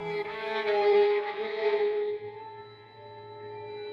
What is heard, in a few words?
sad violin